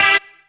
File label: dance chord